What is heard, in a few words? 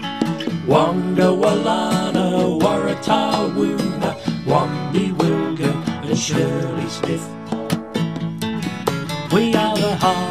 calypso; tugboat